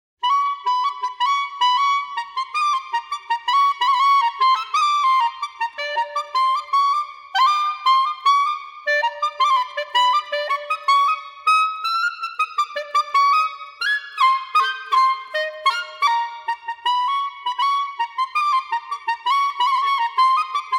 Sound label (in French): clarinette en mib; Stravinsky